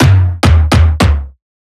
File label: tom, Drum One Shots; Slate; SD MIDNIGHT Sample Pack; Toms